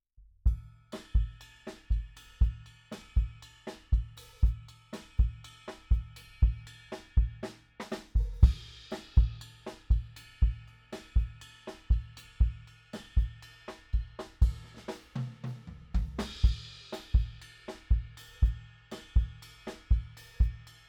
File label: Kick